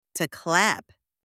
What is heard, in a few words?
to clap